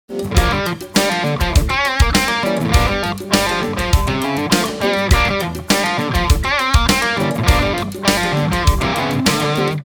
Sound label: Riff